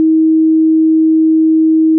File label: pan linear